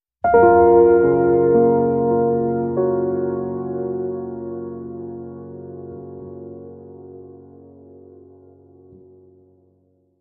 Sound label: Ethereal Piano Sting